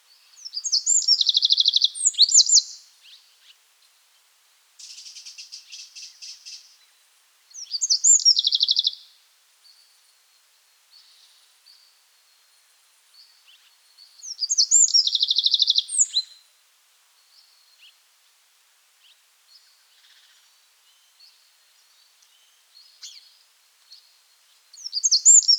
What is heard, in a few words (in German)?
Vogel; Stanislaw Wroza; xeno-canto